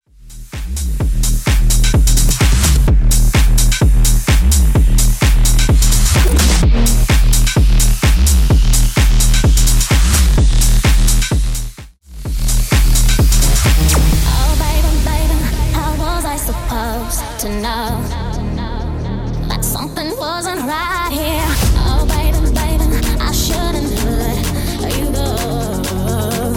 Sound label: Bass House 126BPM; remixplanet